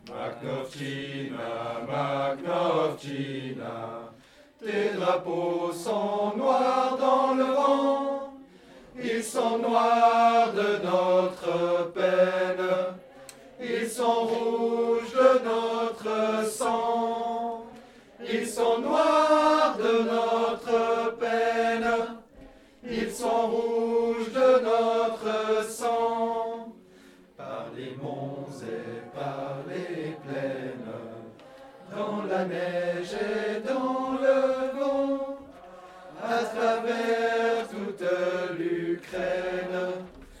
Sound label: Tenor